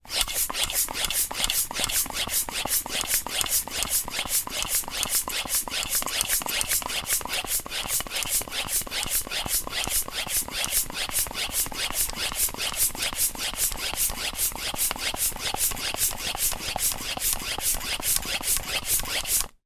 transport bicycle hand pump operating med speed handle piston end